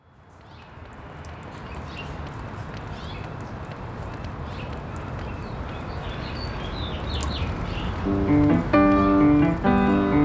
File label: House Finch Ditty; dude chilling park